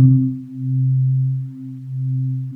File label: SWEEP C2, Synth; USB Soundscan vol.28 - Choir Acoustic & Synth; AKAI; SWEEPOR